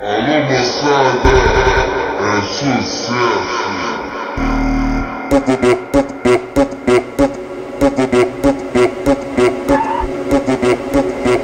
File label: bassboost